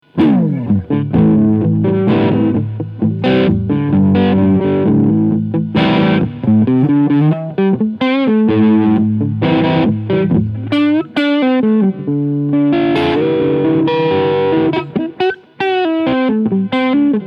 G&L Tribute Asat Classic Blues CAR Crunch Front PU